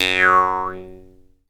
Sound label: JEWS HARP, Earth Tones; Jew's Harp & Spoons